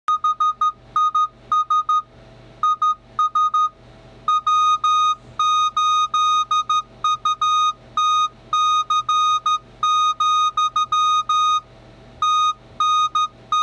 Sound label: Morse Code 13w; Morse Code Greeting; W8UTC